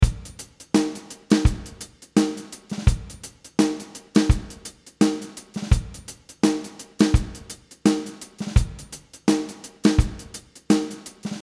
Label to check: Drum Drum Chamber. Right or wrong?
Right